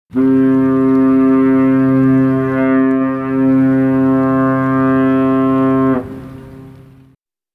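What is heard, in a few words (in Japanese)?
fune kiteki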